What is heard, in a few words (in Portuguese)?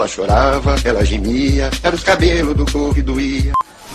ela chorava